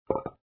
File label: tile click; casual gameplay escape; Puzzle